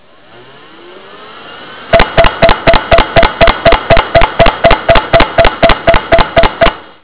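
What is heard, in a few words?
Bad Head, hard drive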